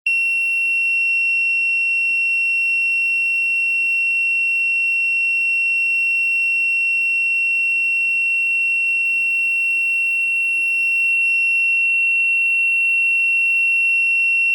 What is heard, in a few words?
Hazardous Gas Alarm